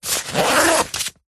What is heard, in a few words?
luggage rucksack backpack zipper; zapsplat